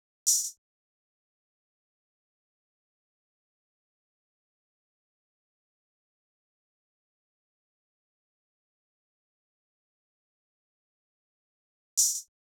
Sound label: Knock Drum Loop 82 BPM Open Hihat; Drum Loops; Loop Stems; Hallway; Cymatics; Mystery Pack Vol 4